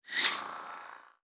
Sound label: Sparkle hybrid transition; chuyen tiep